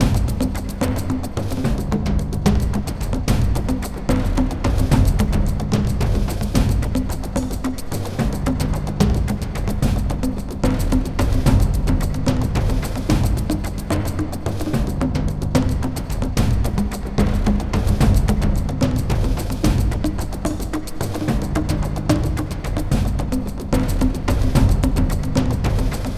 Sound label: Idle LOOP; BrokenGarden